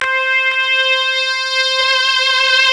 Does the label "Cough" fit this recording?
No